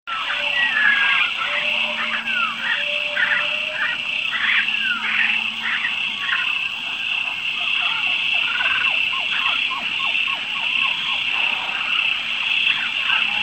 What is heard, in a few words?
Birds Forest Tropical